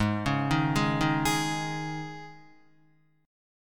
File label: Ab Suspended 4th, Guitar; Absus4 arpeggiated; 4,4,1,1,3,3; JGuitar